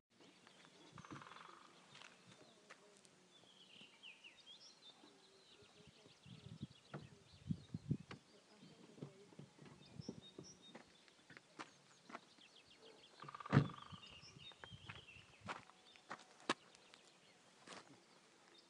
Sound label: woodpecker